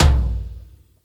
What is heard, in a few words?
Drum